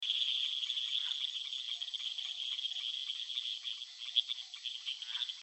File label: Leptodactylus fragilis